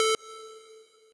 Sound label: sq lead